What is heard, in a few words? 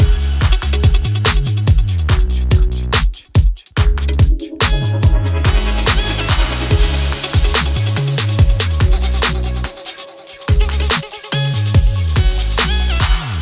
emotes music shoot; fortnite emotes; athena